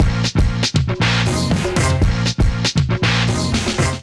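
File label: Kit Groove 119 bpm, Drums; MIDI Clips; Ableton; Chop and Swing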